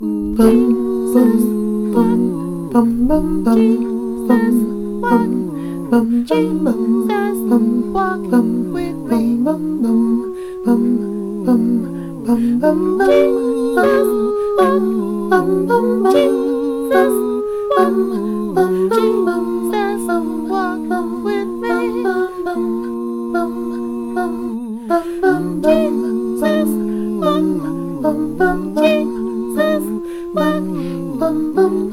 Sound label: BASS